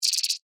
Insect Voice Chirp, Vocalization, Bug; Animal

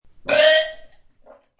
Weird choking sound; Crazy Choking Sound; Gagging (Sound Effects:Voice)

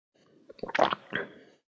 Swallow, Swallowing sound.; Drinking

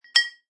Single bottle hit.; Clanks; Objects

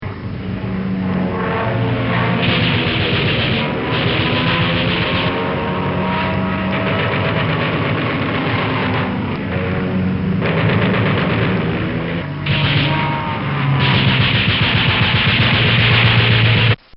Ww1 Bi-Plane Dogfight; Airplanes; Vehicles; Bobby Cole

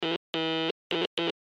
Morse Code L Letter; Blastwave

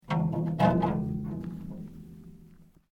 Metal Creak Soft; Materials

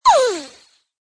Zing, Small ray gun, sci-fi, FX from human voice; Tech Sound Effects

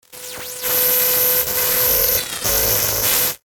static electronic; Static Electronic Glitch; Production Element Imaging Element Accent Transition; Blastwave

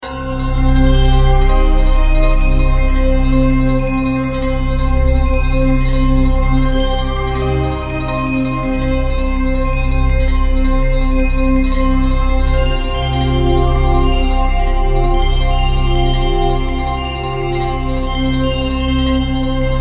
Synth, Stab, Drone, Horror, 5.1; Chimes, Creepy, Musical; BlastWave

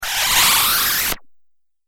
Thick Suction Swoosh; Whoosh Sound Effects; Andrew Fleming; Twistedtracks

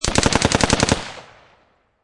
Machine Gun Colt AR-15 Long Burst Medium Range

